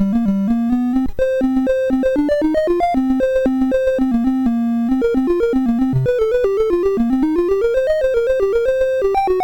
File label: BASIC, sid MOS6581R4; Latimer Joey